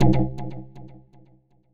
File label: error; gnome-sounds